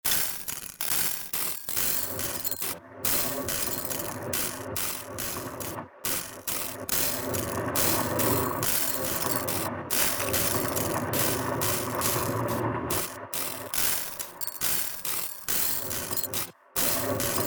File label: jingle; VSCHT